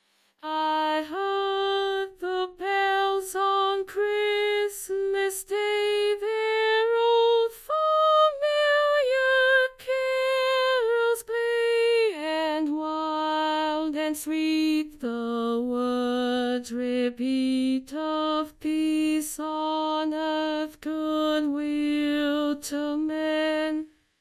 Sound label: Alto only, choir practice; summerwind ward choir practice